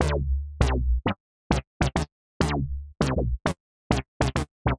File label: Skroink Pulse Synth, Keys; Rock